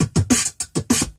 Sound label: rizz, Beatbox, Drum loops